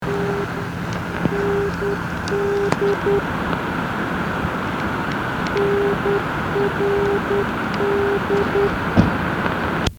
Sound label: NDB beacons, received; Inowroclaw; NRD 445KHZ; in Torun